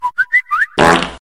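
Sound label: Whistle Fart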